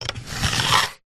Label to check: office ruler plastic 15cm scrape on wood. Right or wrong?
Right